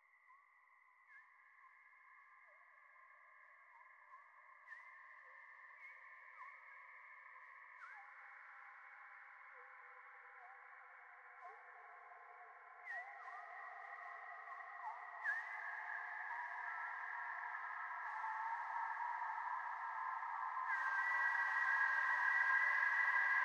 FX

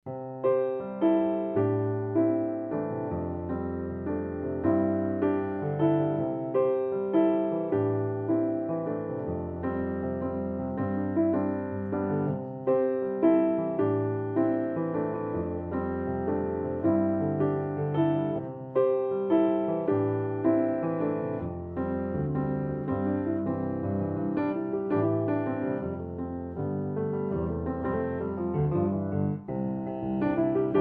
Solo Piano

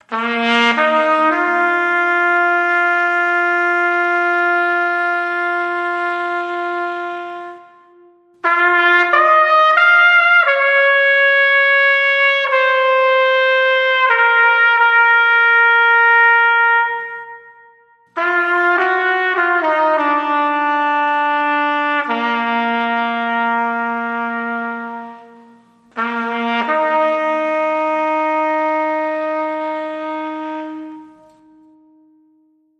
Lonely Trumpet Sting

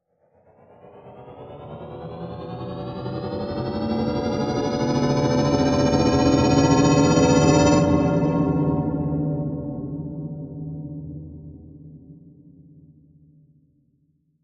Cityscapes Glass Flutter Rise

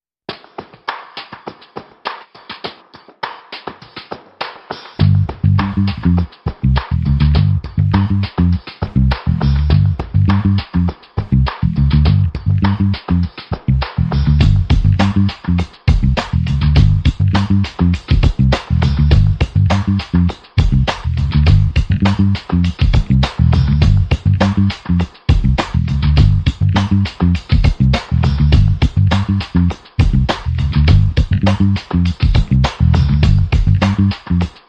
Bassik Funk, Drums and Bass Only

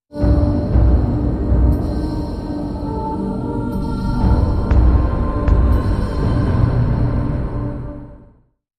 Beat Sting

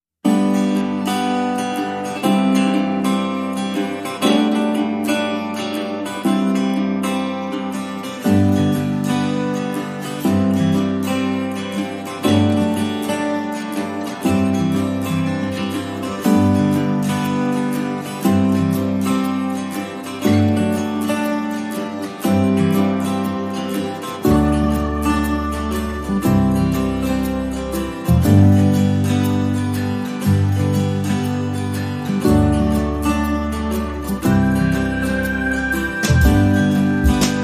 No Melody

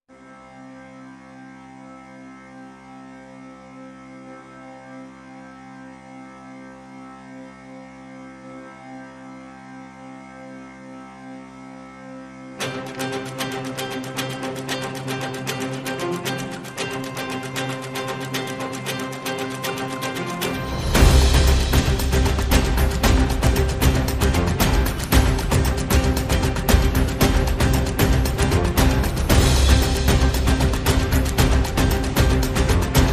No Melody

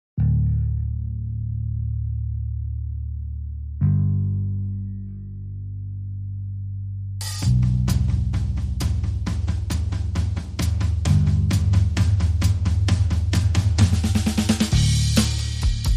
Drums and Bass Only

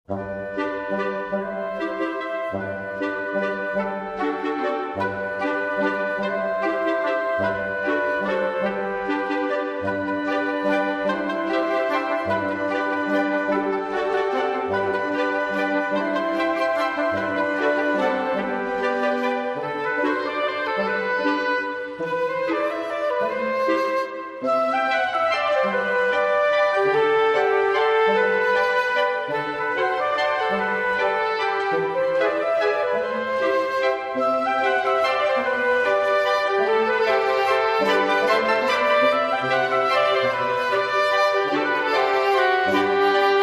Woodwinds and Brass

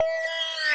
Canned Vox Transition

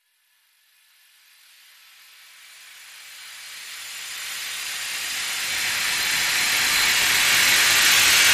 Falling Rain Reverse

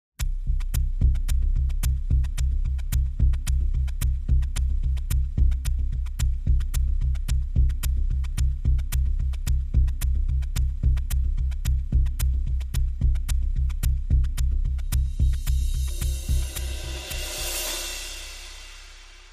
Ticking Tension, Drums and Bass

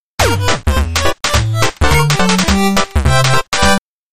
Happy Sunday Jingle

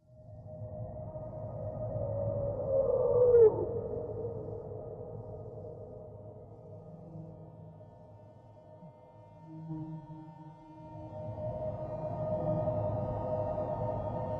No Percussion

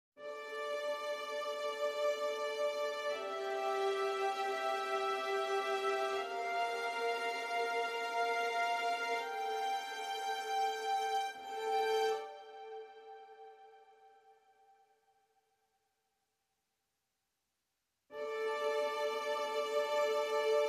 Strings Only

